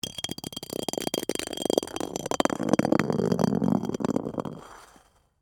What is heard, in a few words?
Ice Bowling Ball, Roll; Texture; Rolling; Objects